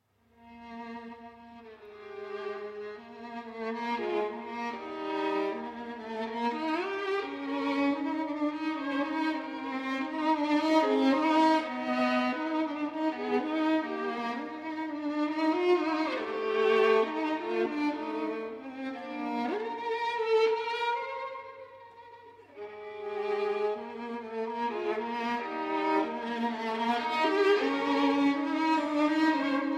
Études-Caprices For 2 Violins; Op. 18; Wieniawski

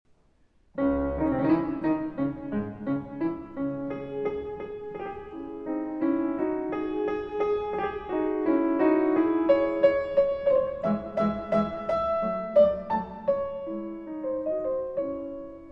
Keyboard Sonata in C Sharp Minor

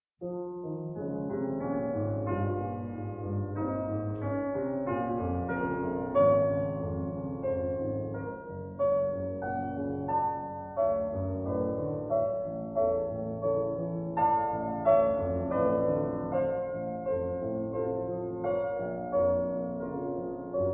Male Voice Choir; Climax Male Voice Choir; Songs of the Sea, Op.91

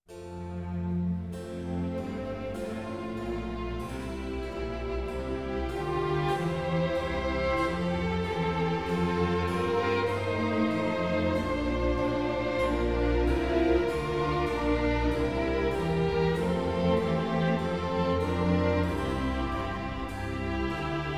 Concerto Grosso in A Minor, Largo, e Piano; Op. 6, No. 4, HWV 322

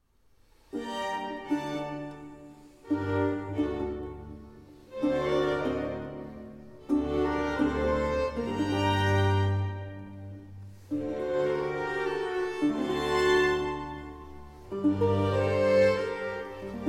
Violin Concerto in G Minor Allegro non molto

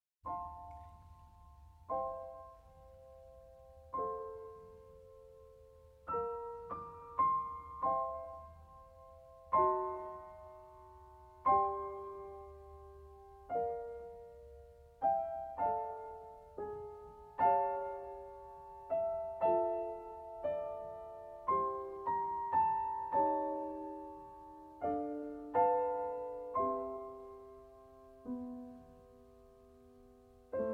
Cello Sonata in A Minor, Andante Molto Tranquillo

